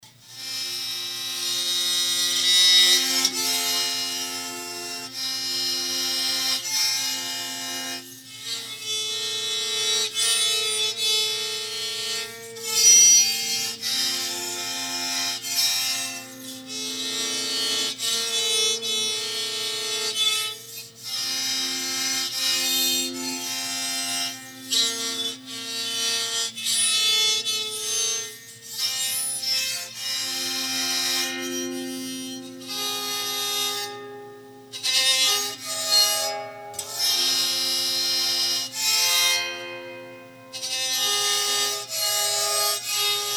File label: dulcimer bowed